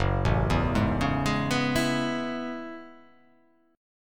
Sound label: Gb Minor 11th Gb down; Gbm11; arpeggiated; JGuitar